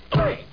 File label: minimize; Lion King